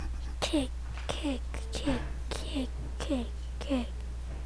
kick

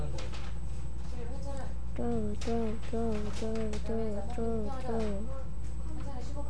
throw

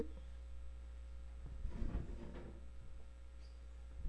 explode

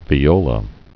viola

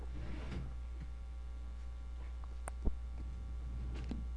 warning

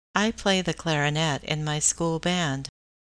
clarinet

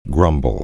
grumble

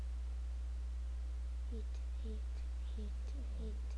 hit

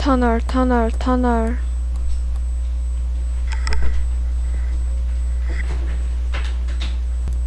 tunnel

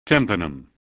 tympanum

